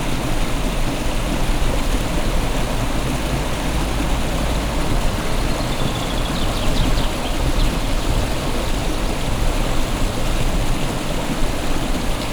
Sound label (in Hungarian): vízesés madár repülő, patak; visegrad